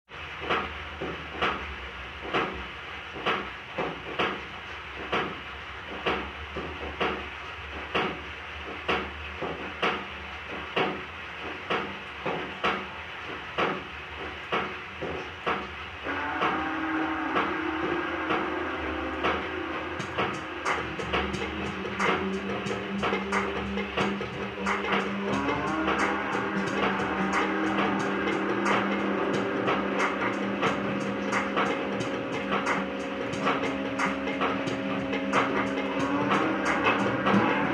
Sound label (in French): rain Rock industriel